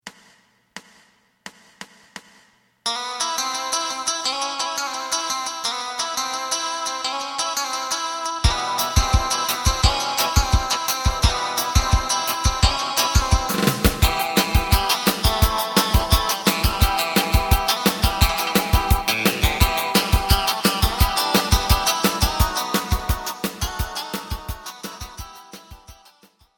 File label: bass